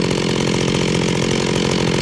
SAW IDLE; WEAPON; MEATCUTTER

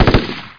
M4 3 shot; SpecOps2 MPlayer Demo

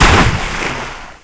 splash; Ferazel's Wand Demo

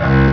Cello

